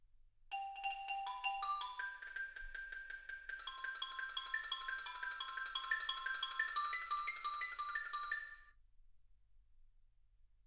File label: xylophone